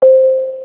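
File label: message sent; NATICQ2; Naticq pack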